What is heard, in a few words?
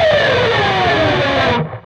PRS SCRAP, Guitars; PRS SCRAPES